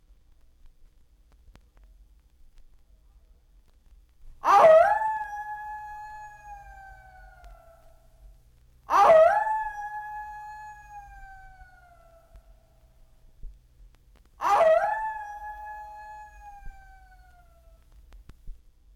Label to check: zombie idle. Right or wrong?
Wrong